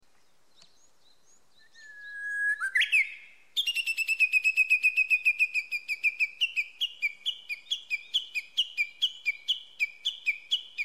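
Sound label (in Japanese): ugu, 野鳥の声; ウグイス; とても有名でありながら、ほとんど目にすることが出来ない、とても警戒心の強い野鳥です。声は聞こえど姿は見えず…、目をこらせど見つからず。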